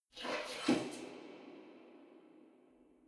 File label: walk workout squat out phase